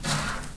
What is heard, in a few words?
wpn deny select